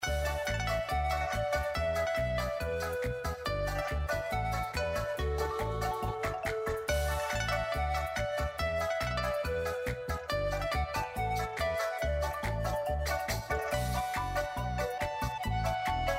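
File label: Background Music FREESPIN, SLOTS; Magic Coin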